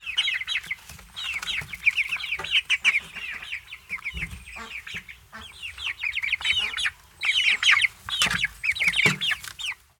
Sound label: Chick Squawks, Farm Animals; BIRDFowl; Mechanical Wave